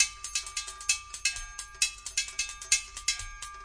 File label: 131 pretty percussion, drum loops